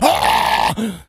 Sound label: rage at victim, infected